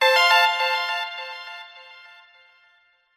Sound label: game tone musical end complete bright chime